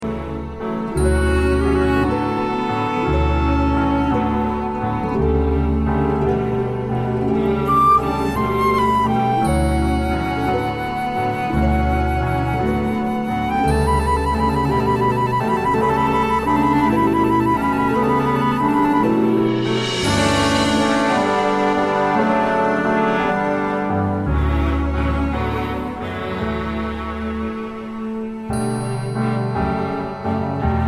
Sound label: accompaniment only; audition